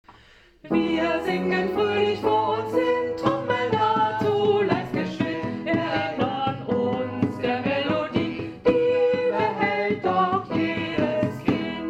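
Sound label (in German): Musik Mit Instrumenten, Kinderlieder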